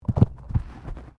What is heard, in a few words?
Body movement Fall Heavy